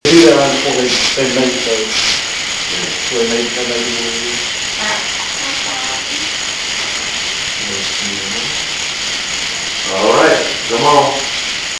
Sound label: slap somebody